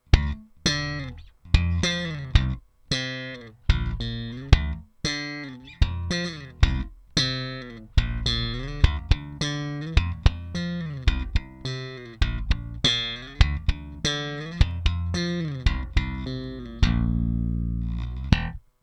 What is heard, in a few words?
trace elliot ELF slap